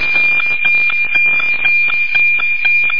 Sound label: decode sound